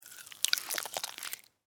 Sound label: Slug Crawl Move, Slimy, Wet; Animal, Slug; Short, Insect, Bug; Worm